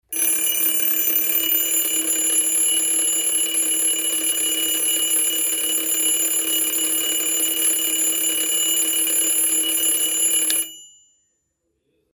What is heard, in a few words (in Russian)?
zvonok i vykluchenie